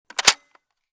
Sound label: lid close, m249; weapons; Urban-Coalition; Anarchy; arccw ue